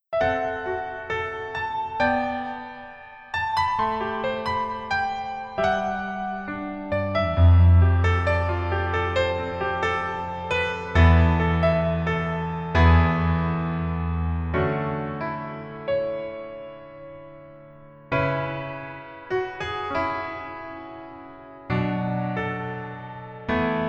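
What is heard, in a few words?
Piano version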